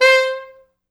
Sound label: C4, Pop Brass; Best Service ProSamples vol.19; AKAI